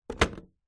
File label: foley wood box case small set down on concrete; zapsplat